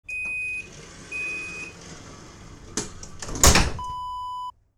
metro door close

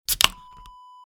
beer can open

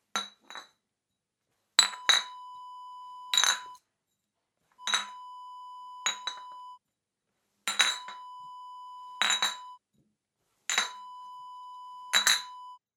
glass bottles bag

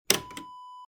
button switch

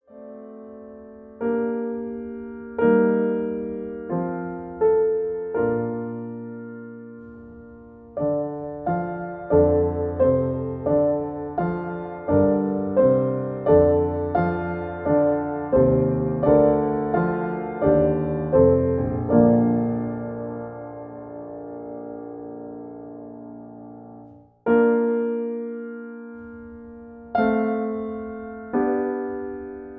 Accompaniment B flat; Pie Jesu

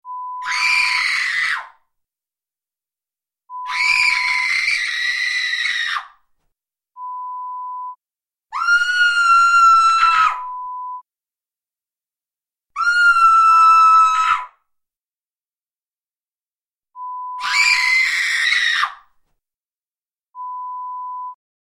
Screaming sound

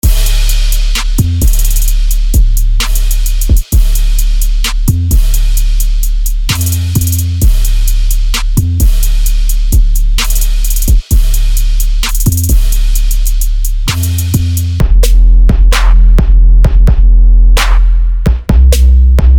trap drums

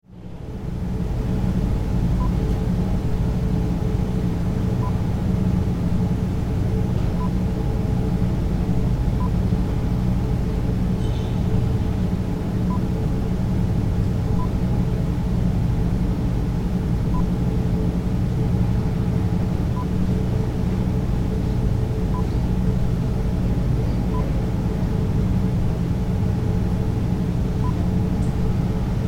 Modern Hotel Room Ambience Daytime

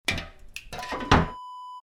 sauna water bucket

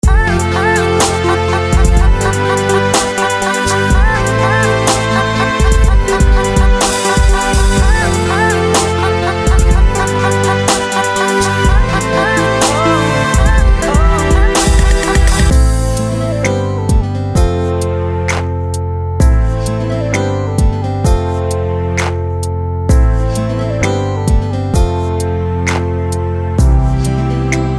RnB Loops

